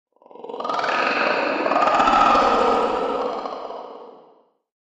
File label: Animal Voice; Eerie, With Reverb. Use For Monster.; Animal Vehicle Growls